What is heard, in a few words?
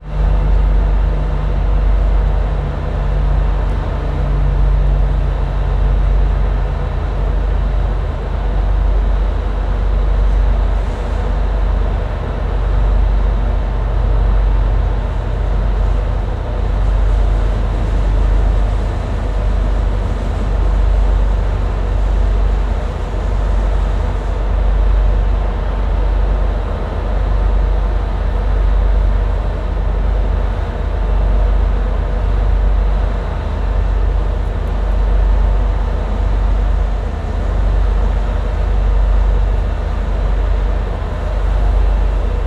transport boat ship ferry idle at dock